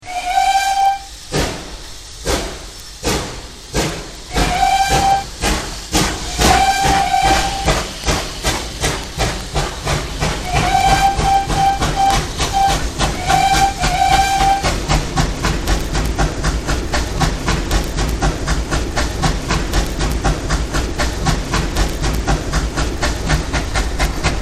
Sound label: Decca train